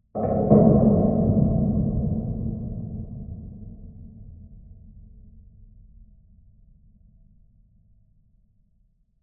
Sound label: Cinematic Metallic Hit, Boom, Trailer, Sub; Cinematic Hits & Impacts; Jake Fielding; Haunted Metal Vol.1